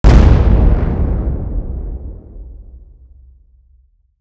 ship explosion; weapons; Stellaris

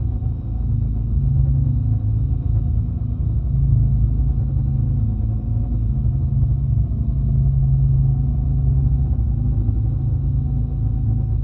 spy orb hum; Stellaris; utopia

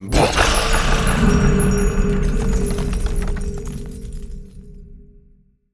lithoid angelic; lithoid portrait; Stellaris

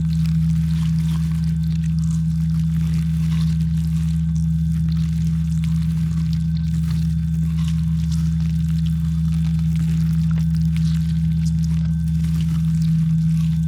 ant ship science idle; biogenesis

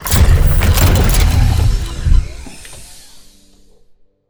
world machine show planet; ancient relics

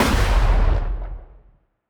lightning muzzle lightning crack, weapons; kaiju; Stellaris; biogenesis

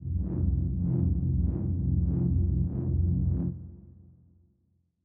kaiju superweapon energy pulse; biogenesis

